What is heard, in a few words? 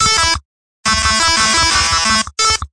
176 buzzy-sh-synth, synth and electronic loops